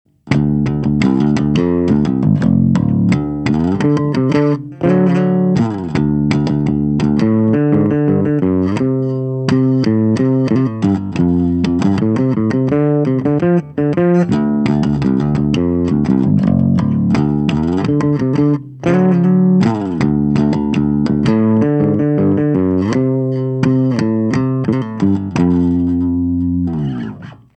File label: Blackstar FLY 3 Bass Rock